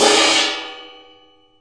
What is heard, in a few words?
muted crash, drums single hits